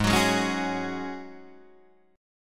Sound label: G sharp 7th Suspended 4th G sharp, Guitar DADGAD